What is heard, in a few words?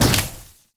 Wulfrum Hook Shoot